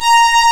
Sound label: Synclav FM STRINGS A#; Big Fish Audio